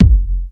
Rap Kick Drum Single Hit F# Key, Royality free kick drum single shot tuned to the F# note